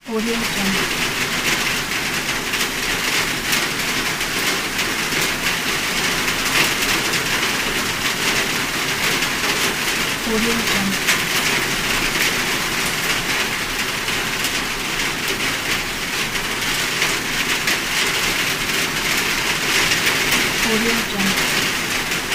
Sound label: rain on the roof